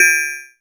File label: BRIGHT, PERC HITS; Zero-G Ambient 1